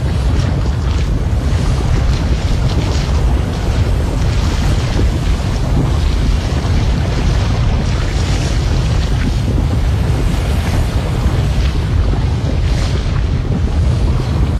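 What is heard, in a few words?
Ambient Lava Loop; Silent Hill Homecoming; PS3; X360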